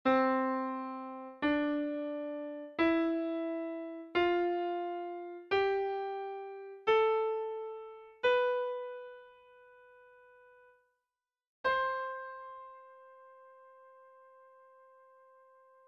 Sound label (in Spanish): Escala